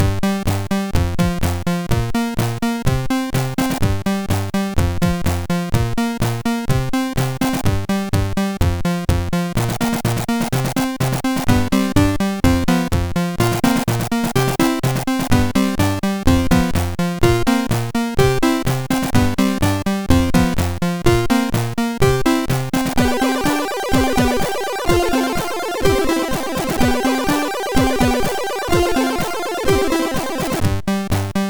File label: Quick Tune, MOS6581